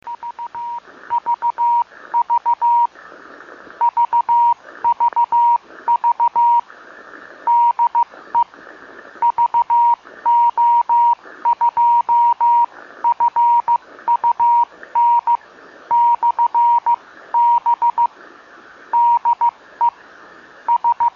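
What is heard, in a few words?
50.003 MHz, ham radio beacon; VO2FUN/B in northeastern Canada, grid square FO62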